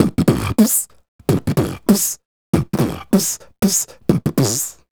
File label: Beat Box, Hip-Hop and RnB Vocals